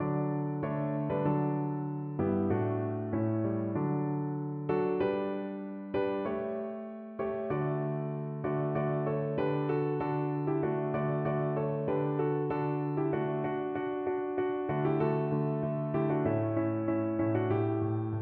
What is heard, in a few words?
piano